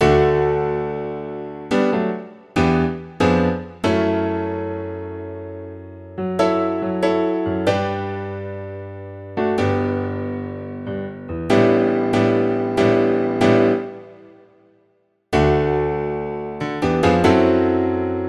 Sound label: Piano